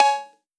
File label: Perc Clav Strong, 909 Percs; Slate